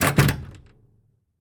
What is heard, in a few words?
door close; Original TK Sounds